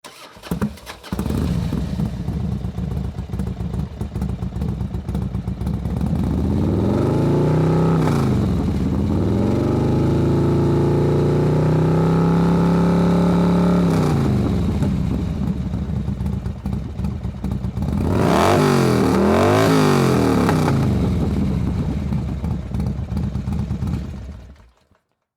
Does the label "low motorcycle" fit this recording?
Yes